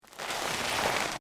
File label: Scrape Ramen Noodles; Texture; Scrapes (Sound Effects:Objects); BlastWave